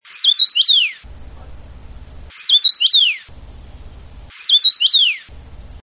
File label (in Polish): dziwonia ogrodowa - inna piosenka